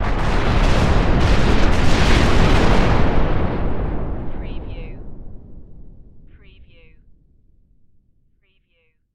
Explosion Massive; Sound Effects Bible

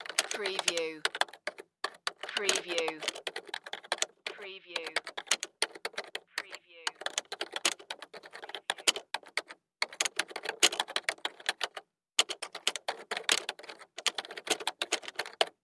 Computer Keyboard

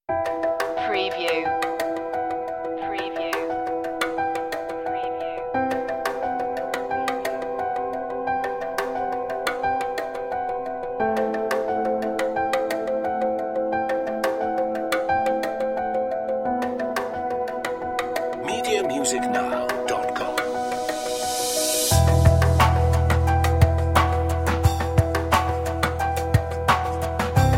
no strings

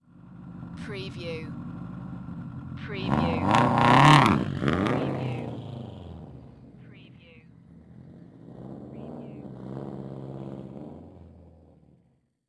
SUBARU STI EXHAUST TAKEOFF

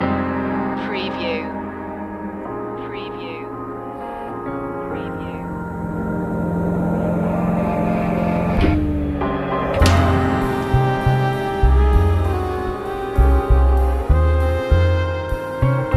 dark long looping

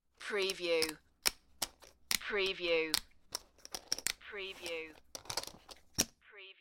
Poker Chips Collecting from Table

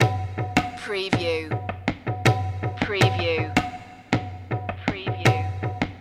indias charm loop

